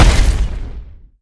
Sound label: step run; gundyr